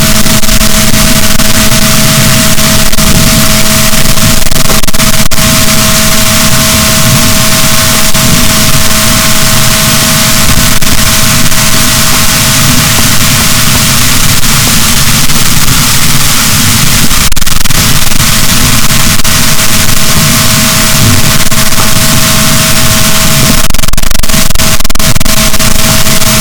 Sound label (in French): rorquals, boat, Balaenoptera Physalus; SNR 6dB